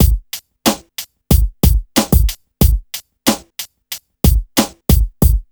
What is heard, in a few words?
90 BEAT, Hip Hop R n B; USB Soundscan vol.19; AKAI